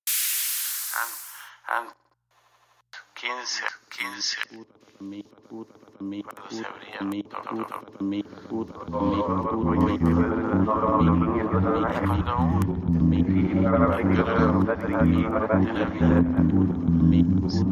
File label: Glitch Etude